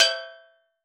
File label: SteelDrums E5; Calypso; Percussion